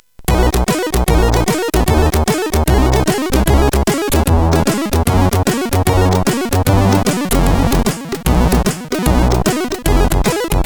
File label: Level complete, sid CSG8580R5; hvsc